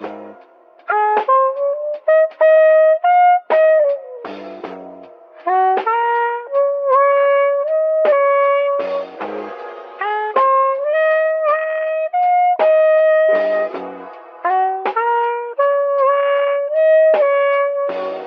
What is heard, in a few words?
sax; Phonky